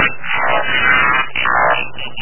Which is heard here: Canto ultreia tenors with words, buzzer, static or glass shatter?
static